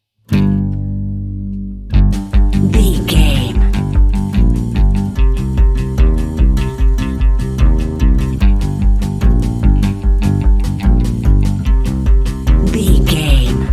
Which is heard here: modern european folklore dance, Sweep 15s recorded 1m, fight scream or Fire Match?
modern european folklore dance